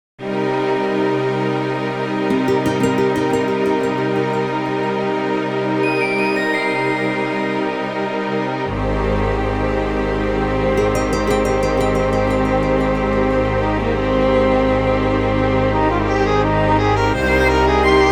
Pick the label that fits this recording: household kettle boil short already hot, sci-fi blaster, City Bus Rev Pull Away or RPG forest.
RPG forest